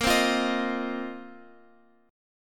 Bb Suspended 2nd Suspended 4th Bb down Guitar Open F; Bbsus2sus4 strummed